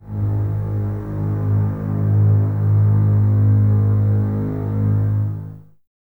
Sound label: Basses G#1, String Ensembles; Miroslav Vitous; 90 s Sample CDs